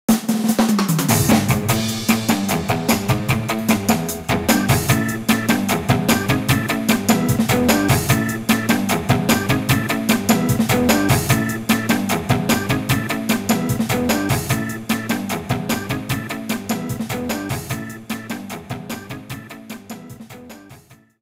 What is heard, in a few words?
Battle Intro; Yo-kai Watch 3; 3DS